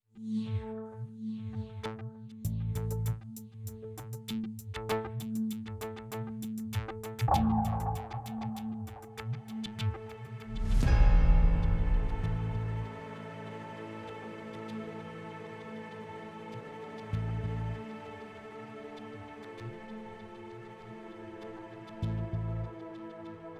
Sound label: suspense